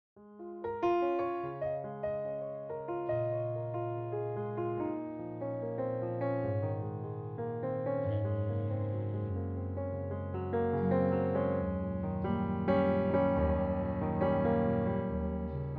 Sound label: Piano Clarinet Musette